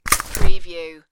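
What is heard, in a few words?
Umbrella Open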